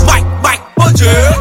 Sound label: Mic mic bungee